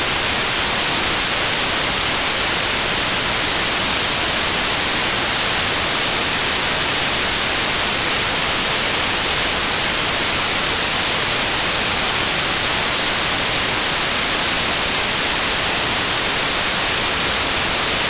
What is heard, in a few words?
WhiteNoise